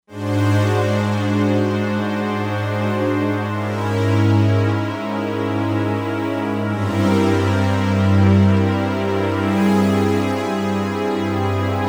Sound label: Pulse String